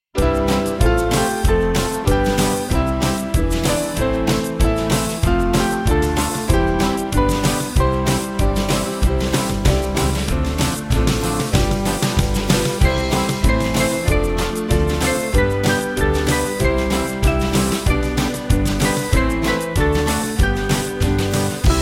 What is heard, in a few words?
Band; Come Let Us Join; Cheerful